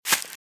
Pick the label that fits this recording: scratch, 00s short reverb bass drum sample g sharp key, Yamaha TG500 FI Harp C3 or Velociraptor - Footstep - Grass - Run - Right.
Velociraptor - Footstep - Grass - Run - Right